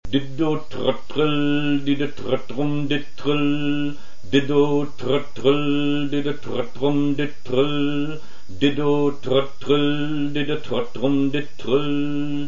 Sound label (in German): Rhytmus Teil 1 gesungen